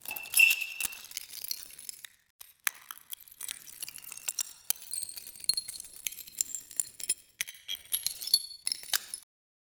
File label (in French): pices cass comme sur, broken